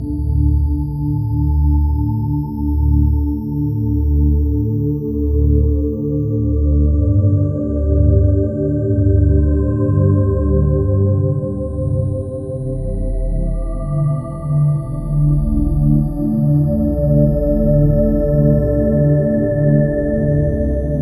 Mus Ambience Space; In Extremis

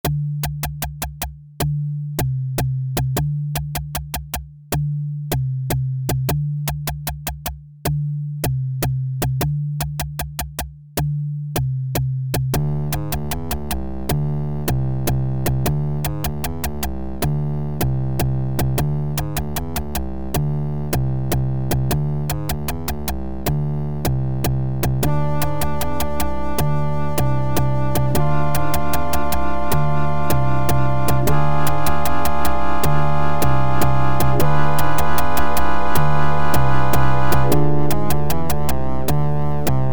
Castle Ring

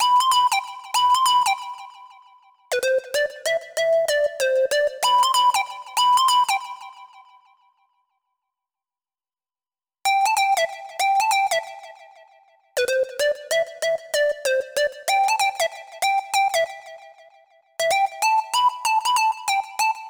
Synth lead melody; vostok inc. switch gamerip 2017

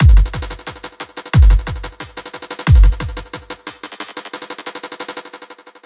90bpm beats D2

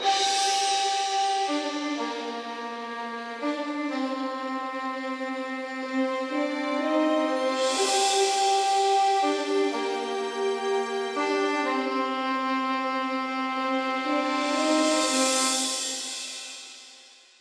Jungle Chase Rnd Pad; Tintin Reporter: Cigars of the Pharaoh

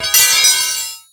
Knight Die Clang; Blastmorph Pinball; xbox series xs

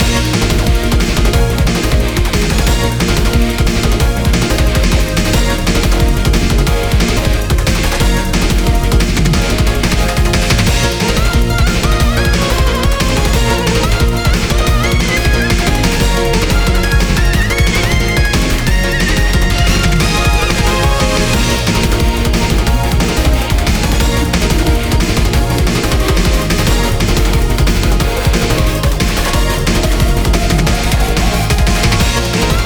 Final Stage Boss Loop; Gravity Circuit

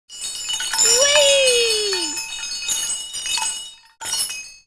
Sfx Toy; Charlotte's Web: Wilbur and Friends

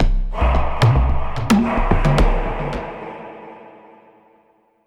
basicMood Cueva Bosque

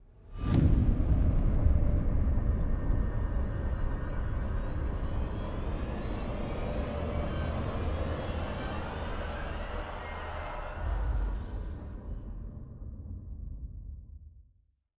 shock subtle; Joe's Diner

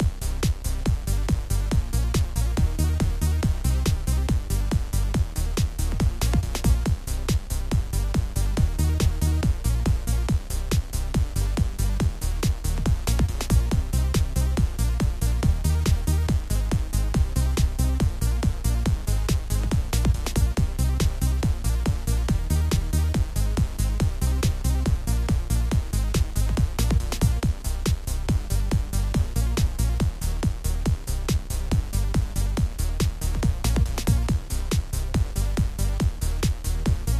Arcade; double cross switch windows xbox one gamerip 2019

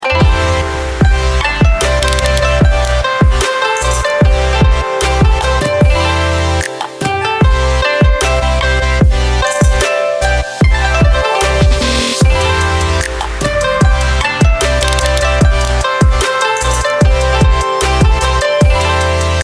Boss Stage

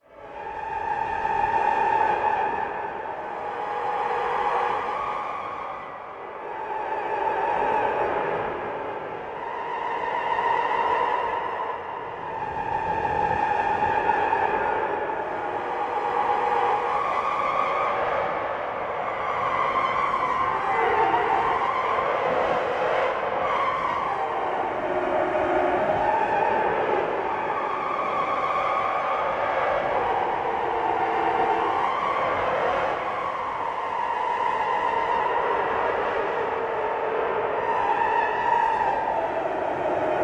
choir

